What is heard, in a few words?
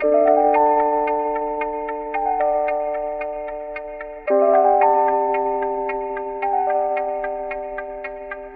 keys, phonky loops